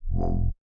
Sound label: Door scan